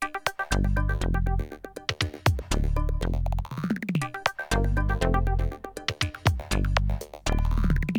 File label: Techno Perc